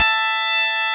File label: SYN, SYNTHESIZER; DIGI WAVE #1